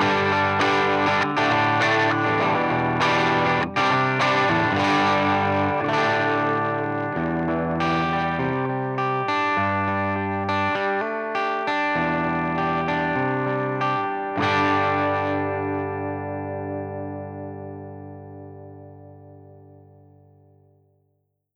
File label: mod cub 8 jensen and jj full gain; laney